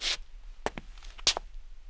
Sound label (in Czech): doslap; Mafie 1